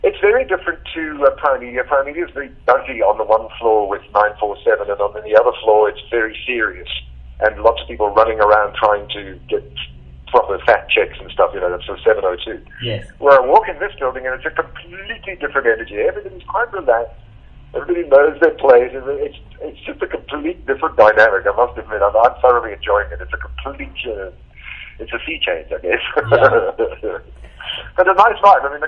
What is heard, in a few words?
atmosphere; Jacaranda